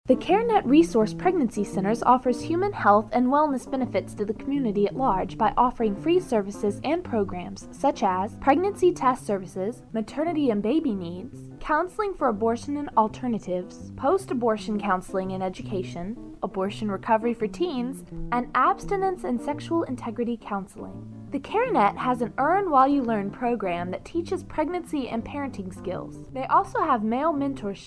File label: care net with guitar